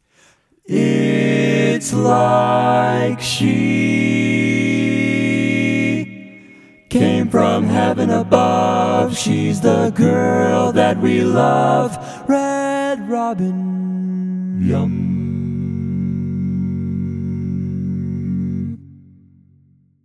All Parts, barbershop tags

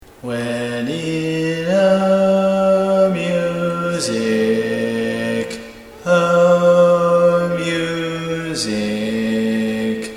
Bass, barbershop

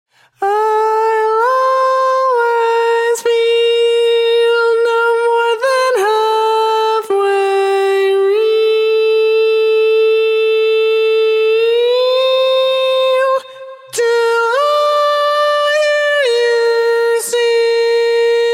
Tenor1, barbershop

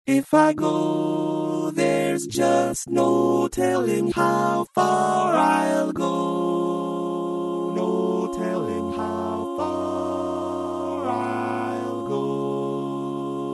Tenor, barbershop tags

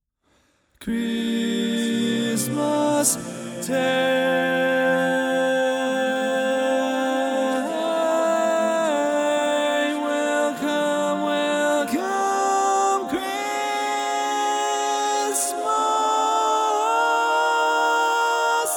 barbershop tags

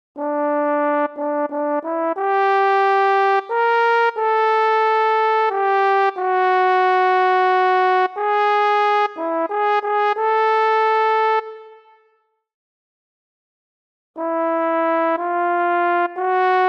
Tenor, Barbershop

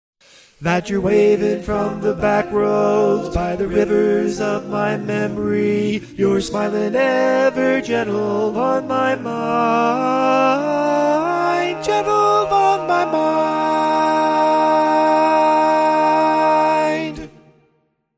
Lead, barbershop tag

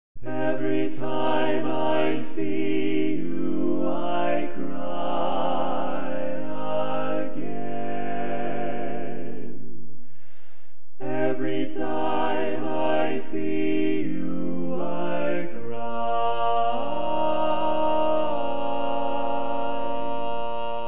Bari, Barbershop

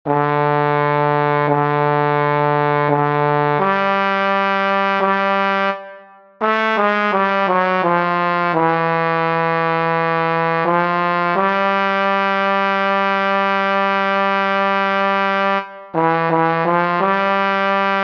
Other, barbershop tags

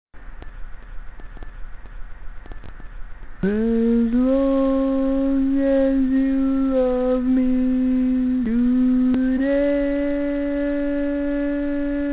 Lead, barbershop

